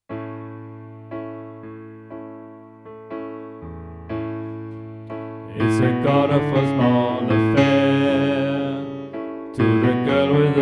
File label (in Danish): Bas; oevefiler